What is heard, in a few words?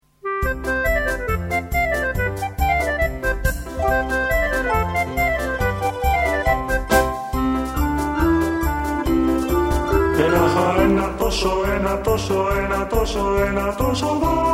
Trio Klarino